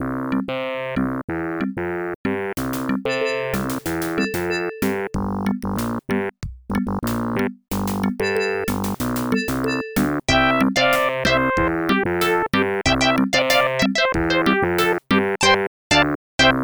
Growing Up Town (Short); SimCity 2000; fm towns

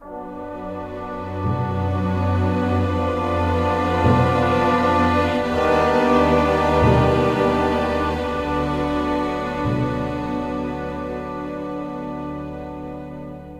fail objective; Hidden Dangerous 2

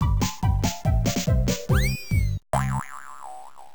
Win - Lose; battle balls; seibu spi system arcade

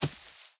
grass; devour 2021 gamerip pc macos

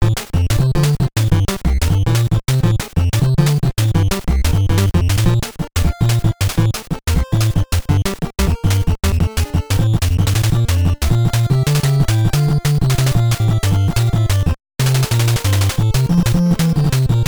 Timer Low; House of Dragon; Mendel Palace; NES

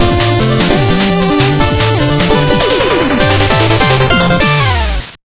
Music Arena; Shovel Knight King of Cards

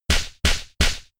Helping Hand; Pokemon; Gen 5; Blk Wht Blk2 Wht2

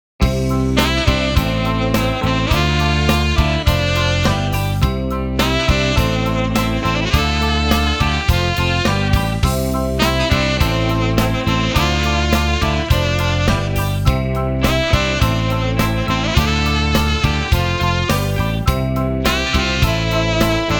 Victory Hotstuff

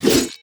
ultimate attack impact; Idle Champions of the Forgotten Realms

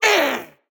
Hit Vo; portal quest; android; Yoda

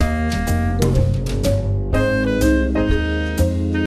serving music

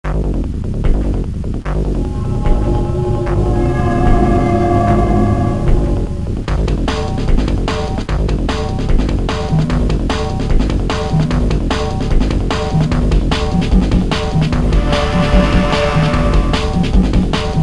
alert theme; Splinter Cell; GBA

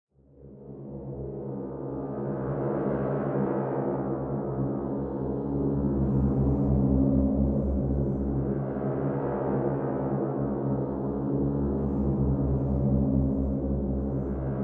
Action Drone clusters

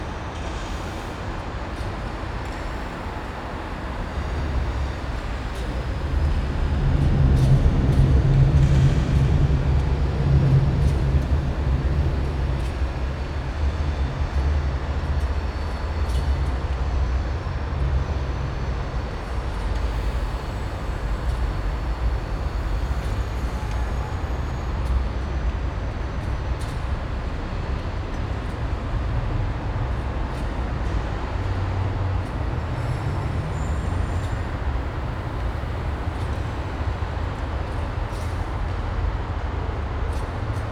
Lower Level Ambience

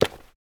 Footsteps, Human, Tile, Run; backrooms 2d android ios online windows gamerip

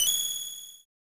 Small Item Fanfare; magical pop n

